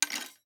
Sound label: ambience room shadow room working layer